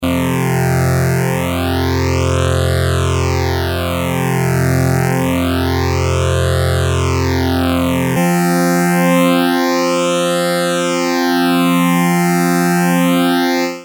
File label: prodigy phase 100